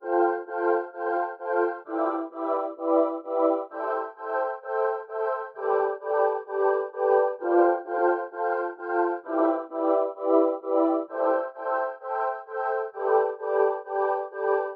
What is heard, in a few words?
130 BPM A Min Pad; Melodies; Loop Stems; Pharaoh Premium Drum Samples (BETA); Cymatics; Cleopatra